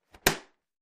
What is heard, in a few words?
plastic lid close, cups